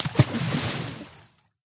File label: splash, liquid; EaglerForge; minecraft